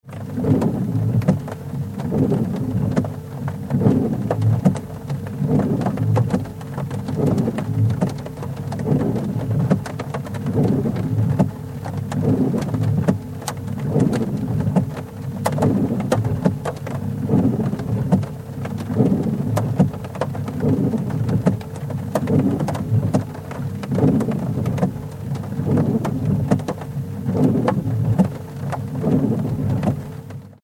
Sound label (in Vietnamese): tieng can gat nuoc mua xe o to keu